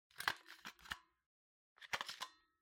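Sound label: Ceiling Light remote control Battery Remove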